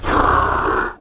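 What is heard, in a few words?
scream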